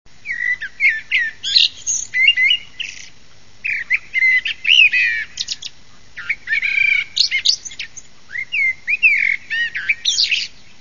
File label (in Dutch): merel